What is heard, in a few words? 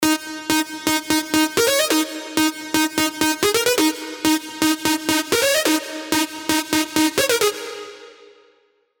Slow Song